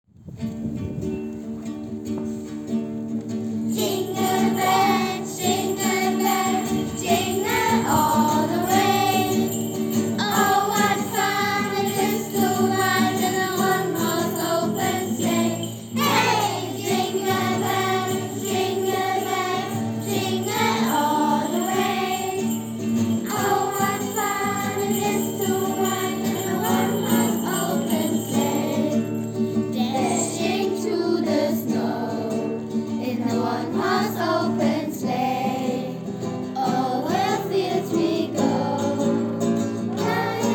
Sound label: Jingle Bells